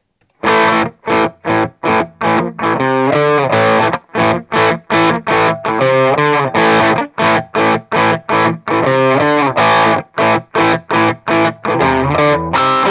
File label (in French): crunch G1 PP micro chevalet double